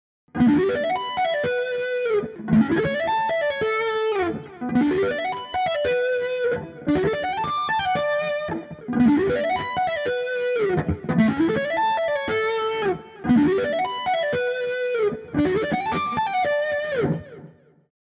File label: lick; godin